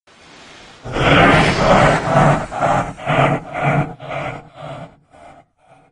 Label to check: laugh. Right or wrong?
Right